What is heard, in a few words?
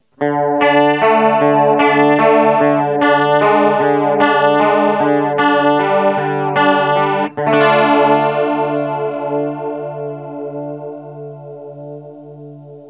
chorus